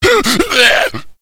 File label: idle, slender shiver